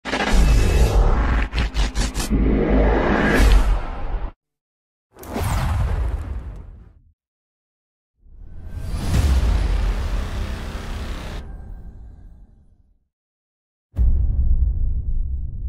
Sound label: Cinematic Sounds; cinematic fx